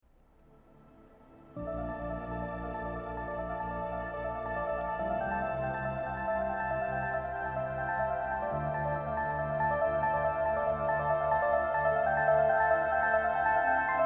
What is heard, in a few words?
inspiring cinematic ambient